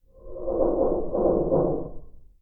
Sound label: Creak; Barotrauma; Barotraumatic; NuclearMine